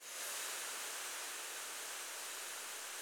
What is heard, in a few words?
CHO, Women-Combi; Sound & Vision; Gigapack I CD 2; CHO Mixed